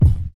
raw bass drum f key, Royality free bass drum single hit tuned to the F note. Loudest frequency: 114Hz; kick drum samples; single hits one shots